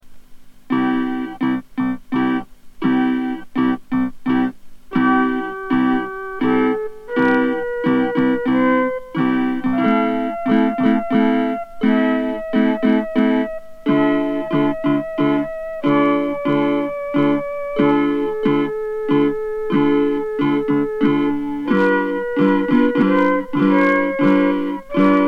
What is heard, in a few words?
melody